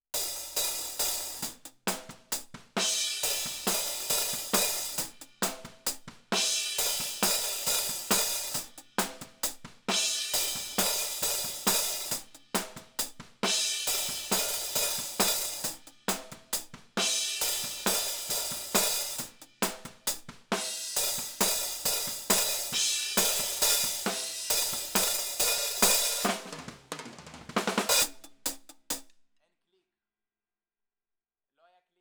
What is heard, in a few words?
Hi Hat